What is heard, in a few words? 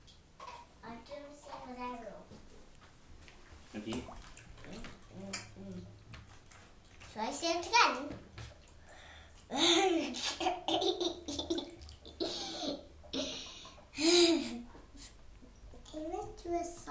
Bathtime singing